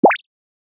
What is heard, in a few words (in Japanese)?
ぴょこ